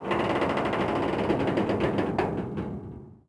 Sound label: stress metal; World of Tanks Blitz; ambient canal